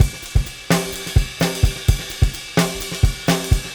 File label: Fusion 128, Beat Loops; Zero-G; Monster Beats